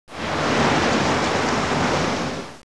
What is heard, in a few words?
water